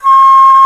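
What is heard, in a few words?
flute long, C4, Celtic; USB Soundscan vol.42 - Celtic Flavours; AKAI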